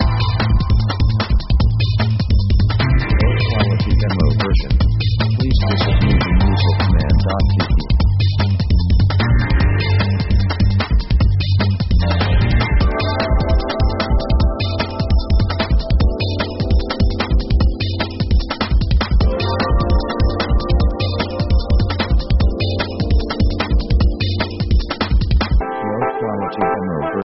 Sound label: electro space; synth themes